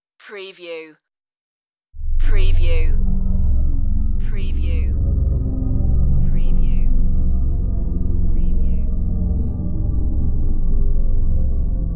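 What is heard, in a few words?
Deep Movement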